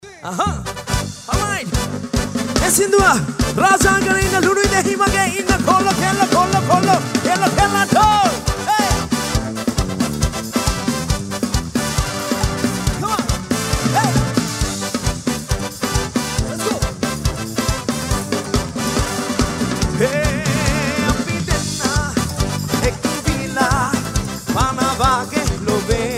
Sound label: TECHNO NONSTOP; AURA LANKA MUSIC FESTIVAL; LIVE IN ANURADHAPURA